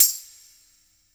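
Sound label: tambourine wet; InVision Interactive; Percussion Slam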